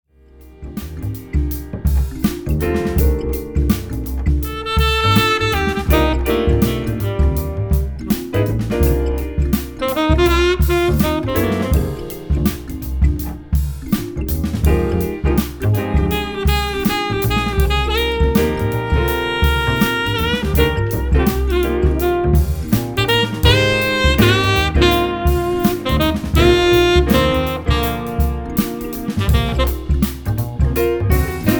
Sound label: Kalimba Blues